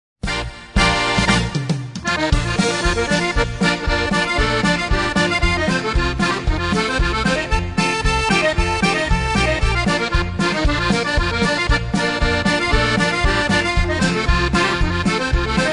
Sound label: Clarinet Polka